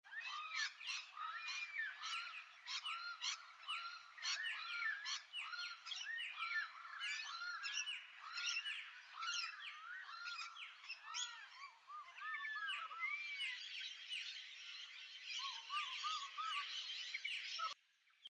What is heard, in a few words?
Straya bird calls